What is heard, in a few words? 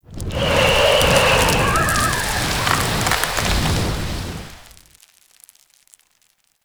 Settlement Wind Debris One Off; Warframe; Grineer Settlement